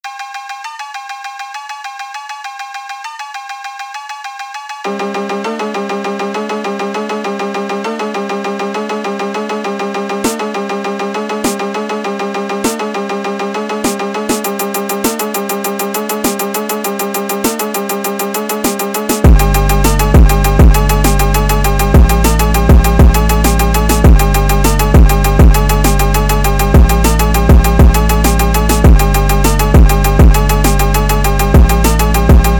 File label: phonk drift